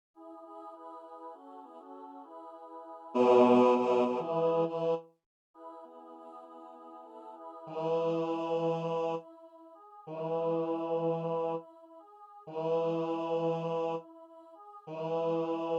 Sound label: Children go where I send thee Bass